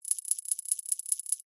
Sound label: game sound coin count